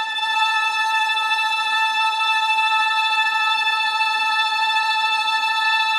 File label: Violin tremolo